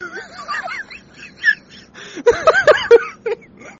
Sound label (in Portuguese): bronquite